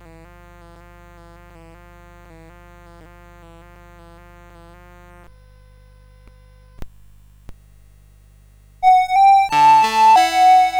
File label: Twins Theme, CSG8580